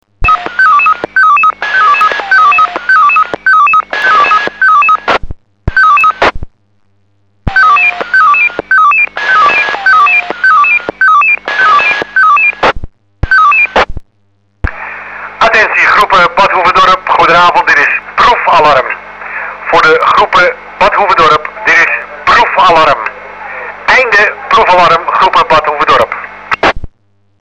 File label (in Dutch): Proef alarm analoog